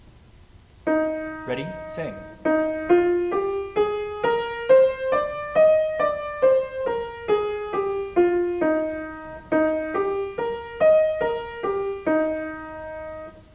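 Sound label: Eb Major, scales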